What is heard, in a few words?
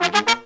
Pop & Funk Brass